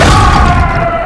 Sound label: Monster Boom